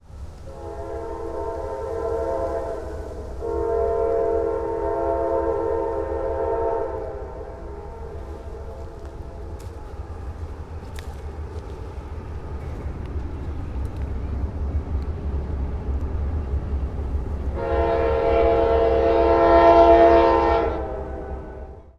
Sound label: train whistle from cliffs